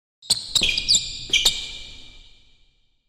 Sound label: basketball sneakers